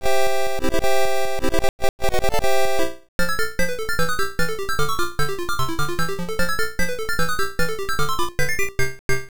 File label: Got a Special Coin; Bomb Jack; arcade